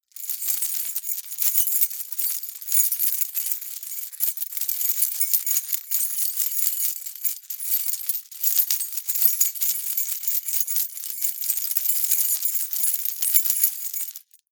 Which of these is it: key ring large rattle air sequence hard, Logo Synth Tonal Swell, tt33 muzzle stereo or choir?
key ring large rattle air sequence hard